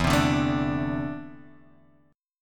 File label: E 7th Suspended 4th Sharp 5th